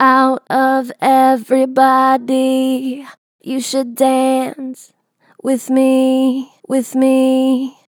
Str Vib Out of everybody, Natural Vibrato Strong, Vocals; Harmor Vocals